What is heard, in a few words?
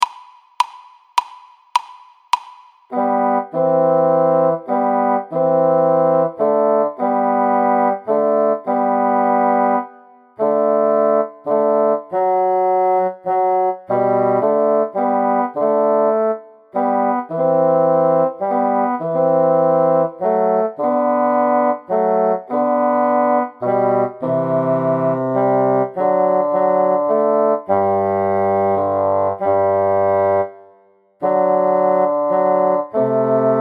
elefantenlied duo, basson